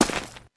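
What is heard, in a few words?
player gravel, footsteps; csgom; cstrike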